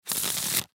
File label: Cards Shuffle; Cards Shuffle Bridge Poker Playing; Casinos; SoundEffects-Blastwave; Sports